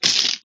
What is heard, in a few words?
till